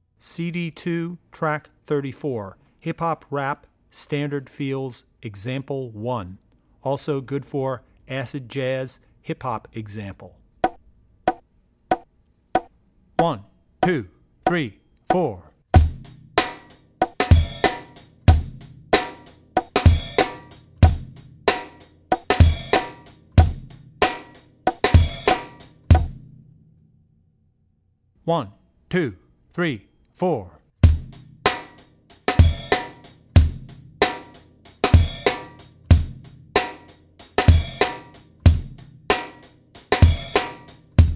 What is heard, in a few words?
Hip Hop Rap - Standard Hip Hop; Drummer's Bible Tracks